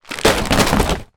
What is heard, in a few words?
impacts food items fall kitchen shelf; zapsplat